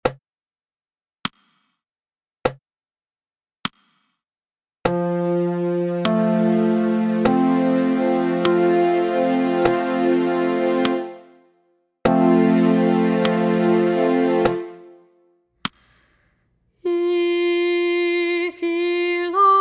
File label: if ye love me soprano no click